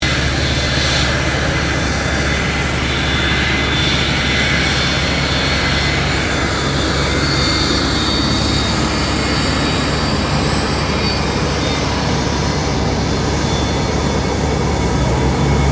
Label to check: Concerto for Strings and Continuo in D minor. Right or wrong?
Wrong